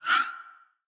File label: Ui Reward Menu Open; portal quest android